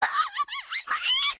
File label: mouse long howl